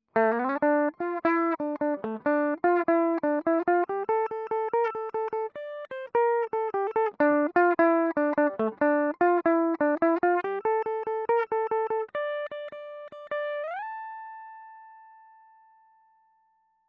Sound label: Guitar; Destructavator UFO Air Combat